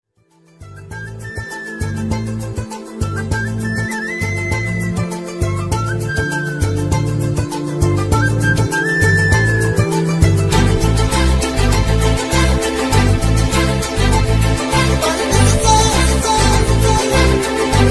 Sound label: movie love ringtone, telugu love ringtones; bommarillu movie